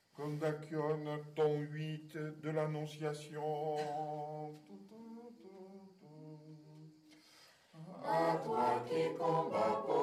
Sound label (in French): kondakion Annonciation